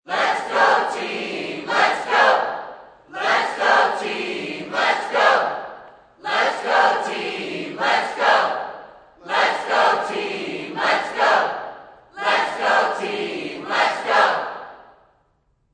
Sports Crowd Cheerleaders Chant Lets Go Team Lets Go